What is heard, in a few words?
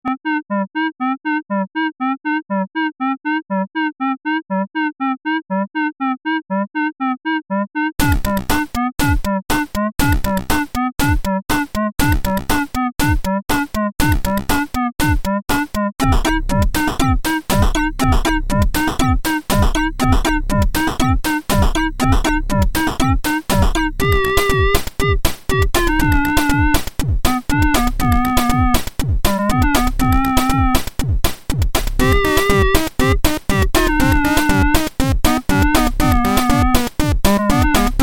organ failure; weeklybeats 2026